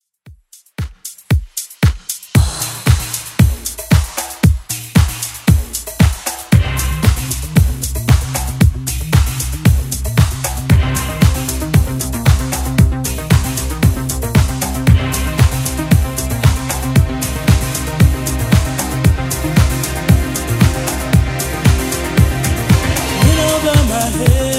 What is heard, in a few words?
90s Redrum 115bpm